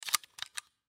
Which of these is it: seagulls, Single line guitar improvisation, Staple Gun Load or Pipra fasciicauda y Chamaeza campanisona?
Staple Gun Load